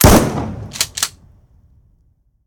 shotgun; battle

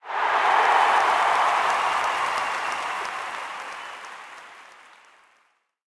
cheer, battle crowds

